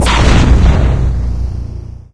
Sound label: launch; svencoop; hl2; superphys